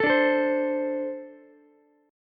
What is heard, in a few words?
A-Minor Double Flat 5th A Ukulele; strummed; JGuitar